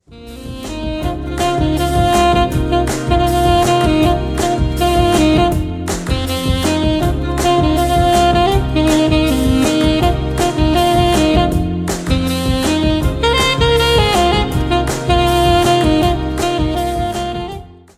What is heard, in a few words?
Alto Saxophone